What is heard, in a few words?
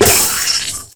SPLASH, Disjointed Textures; USB Soundscan vol.33; AKAI; BLASTER